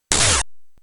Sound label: proto gun; the noise made for when the enemy soldiers fire a bullet; prototype; Shadow Dancer